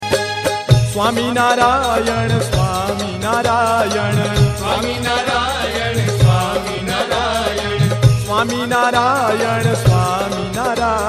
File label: Dhun